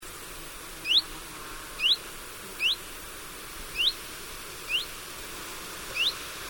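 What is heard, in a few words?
Phylloscopus collybita, Noarootsi; Noarootsi, Läänemaa, Estonia, 6 August 2005; Linnut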